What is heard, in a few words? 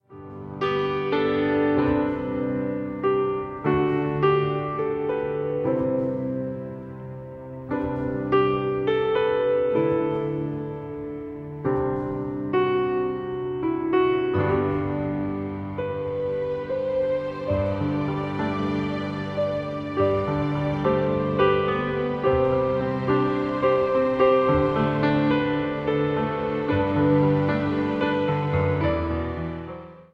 piano hymns; be still piano hymns